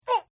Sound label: Grunt; Grunts; Voice; Partners In Rhyme